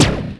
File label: flak; lander